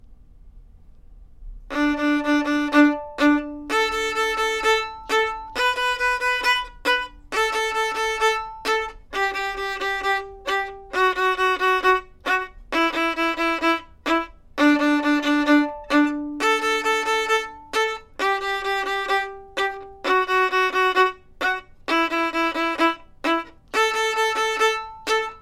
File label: Twinkle Icky Violin